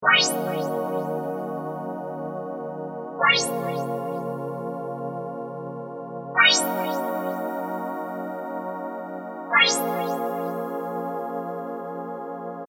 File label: Bpm76 Dm Sweep Synth; Open Road